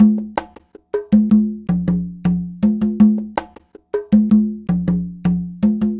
Conga, Latin Percussion

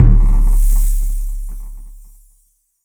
DSP Hit

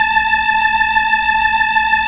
B-3, Keyboards

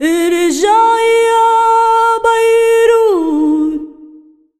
SINGER, ArabianTraditions

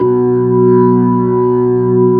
HAMMOND B2, organ; AKAI S-Series CD-ROM Sound Library VOL-2

